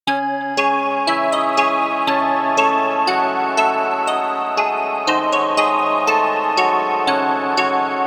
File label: carillon hiphop